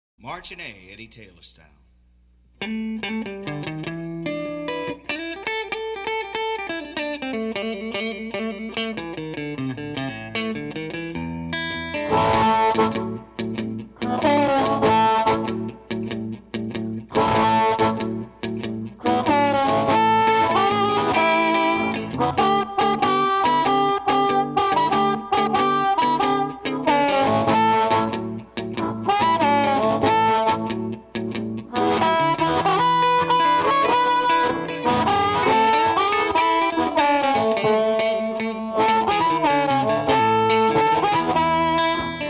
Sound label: A March with Harp; harmonica; Blues Harmonica Masterclass